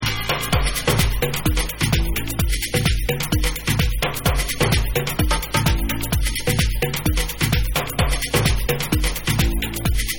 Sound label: TRIBAL GROOVE